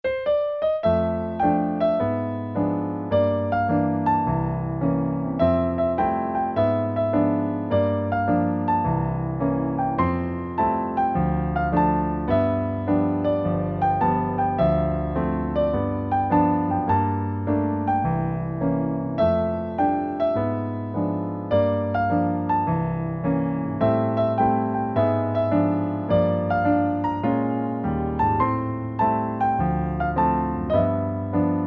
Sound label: Piano